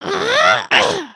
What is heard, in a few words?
flex